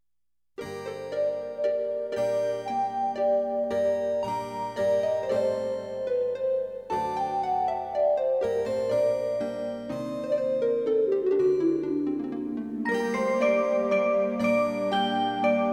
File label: Menuet